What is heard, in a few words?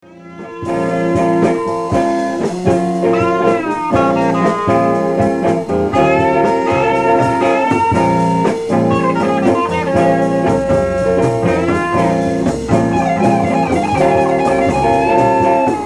fusion